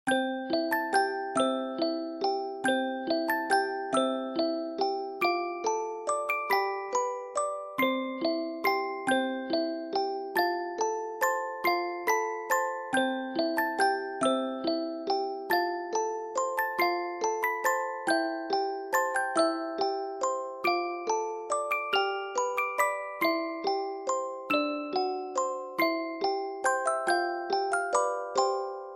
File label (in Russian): silent night shkatulka